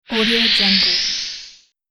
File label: future space elevate gas; sonic-boom